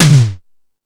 Fusion Stix, Drum Machines

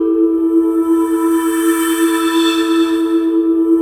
PAD, Drum & Bass, 130 BPM; Ueberschall; Drum & Bass Resonance

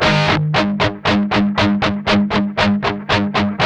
8TH A 3, Guitars; Spectrasonics; Hans Zimmer Guitars VOL-1; 128-JACKHAMR

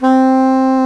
SAX SOP MF C, Soprano Sh

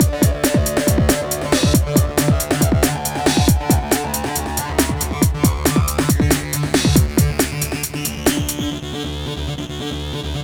Moogyourass, 138 Dmaj, Hardcore Breakbeat; Big Fish Audio; Hardcore Breakbeat Action

